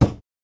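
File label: Hammer Nail Wood Single; hammers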